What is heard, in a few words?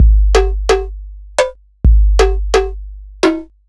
BEAT